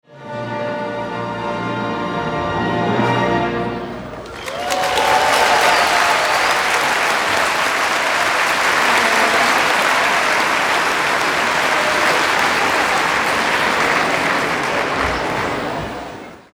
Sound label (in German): klangsafari grosser saal; familientag